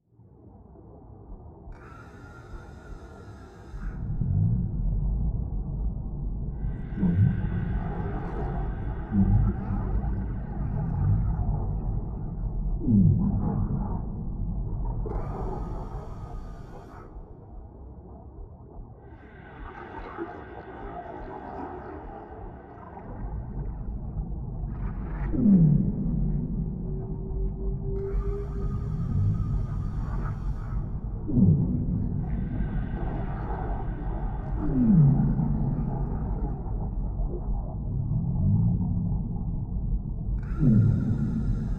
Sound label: underwater whale and diving sound ambient